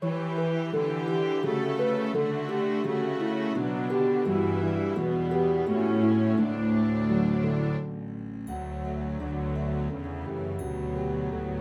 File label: Tracks with Melody; Away in a Manger